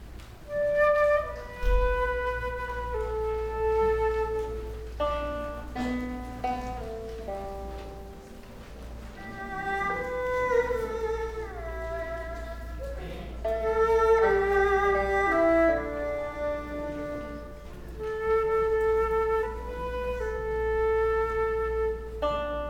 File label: trio folkmusic prelude